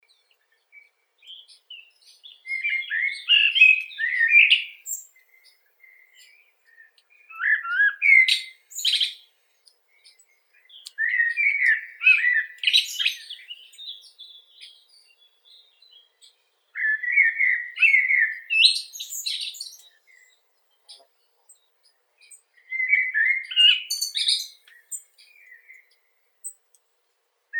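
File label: blackbird, Turdus merula; drozd chernii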